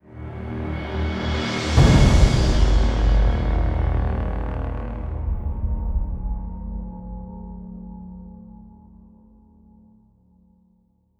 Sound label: Fight End; Black Sky